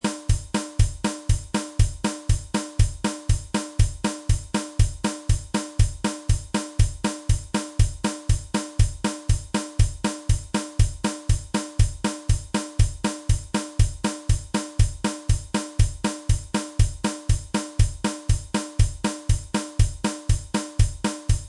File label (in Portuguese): Baterna Country HC; Curso Baterna; Aula 3